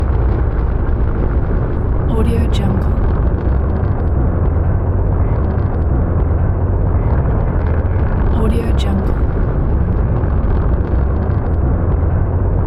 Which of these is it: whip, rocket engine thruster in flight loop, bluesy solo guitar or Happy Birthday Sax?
rocket engine thruster in flight loop